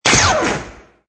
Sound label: saber; Dark Vader